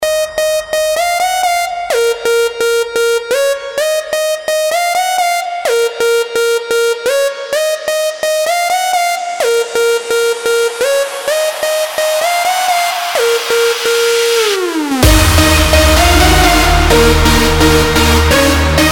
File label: hard rock